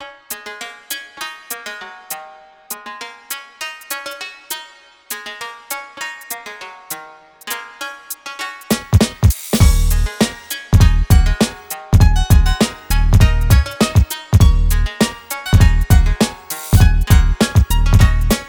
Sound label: 100 Ebmin, Hip Hop Exotica